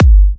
G, Kicks; markus hakala

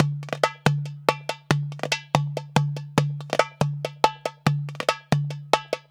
DERBUKA, Balkanish Traditions; USB Soundscan vol.63; AKAI

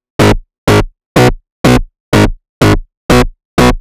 Bass, 124bpm, Nu Dance Electro; Filthy Fury; Zero-G; Soundclash; Acidized Wavs; Combi Loops

